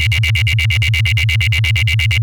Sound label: FM, synth fx